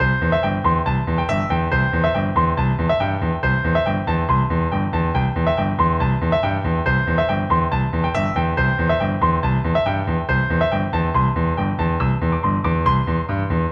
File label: Level Screen